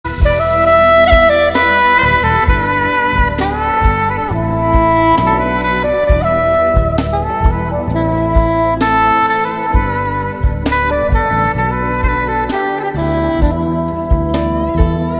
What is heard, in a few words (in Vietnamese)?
khong loi